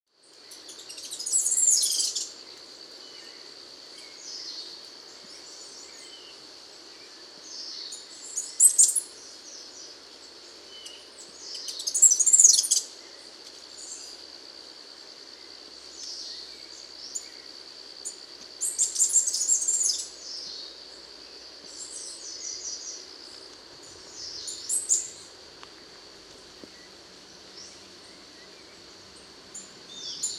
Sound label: Common Chlorospingus